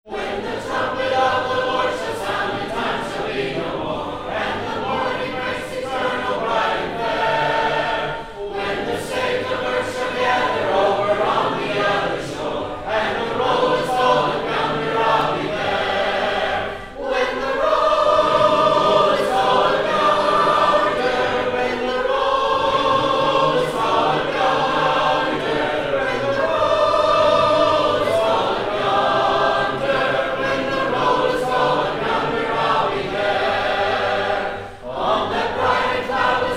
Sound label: a capella hymn, when the roll is called up yonder